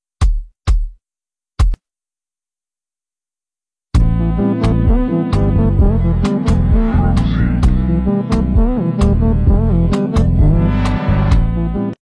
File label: afro jazz